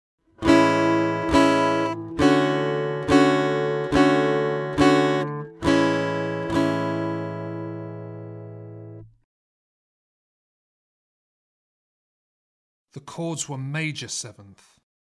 Aural Harmony Test, acoustic guitar; Grade 2

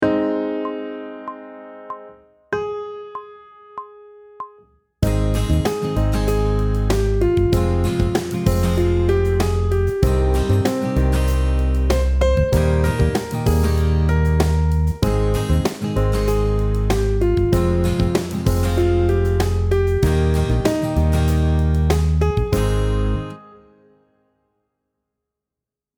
aural tests harm of a melodic phrase; pop vocals; grade 7

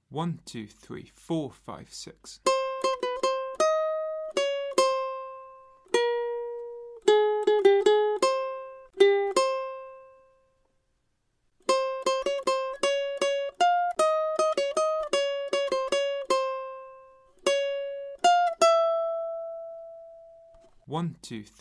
Accompaniment, ukulele; grade5

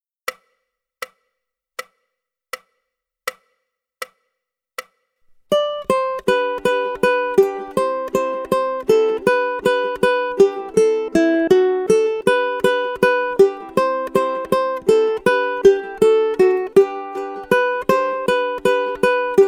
Galway Gallop, ukulele; grade2

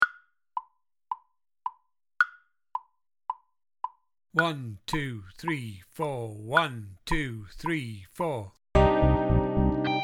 Moon Rock Backing, drum kit

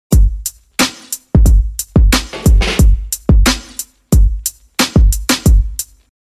Beat Juggling Pattern, DJ; DJ Breakthrough

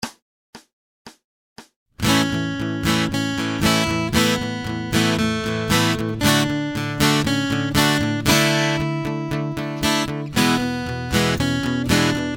Acoustic Accompaniment, acoustic guitar

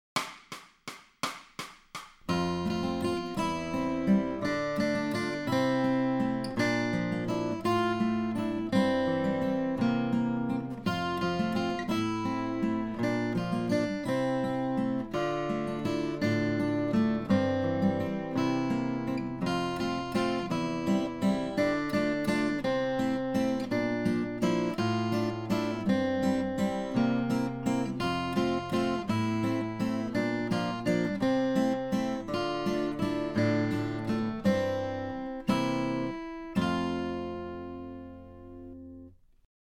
Accompaniment, acoustic guitar; Grade 8

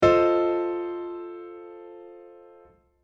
Aural Tests Recognition of Chords; Grade 5; pop vocals

